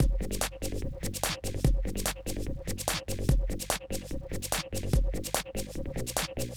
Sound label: victory level complete loop; Replay VHS is not dead